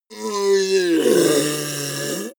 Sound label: ogre grunt painful short